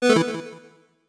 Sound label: cancel